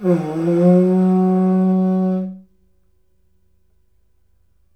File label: TUSK, IVORY HORN; AKAI S6000 CD-ROM; WorldSounds